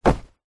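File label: foley clothing jacket hit punch sweetener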